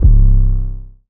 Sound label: 808 - C; Teeth; 808s; Cymatics; 2022 Melody Mystery Expansion